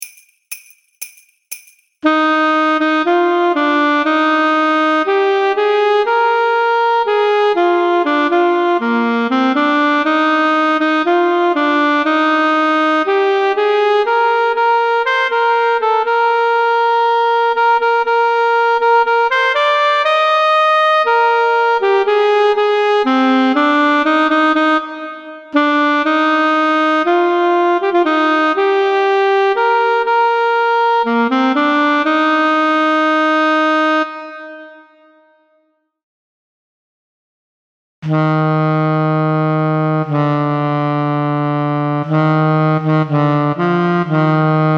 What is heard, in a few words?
Alto Sax; Duets Clarinet Saxophone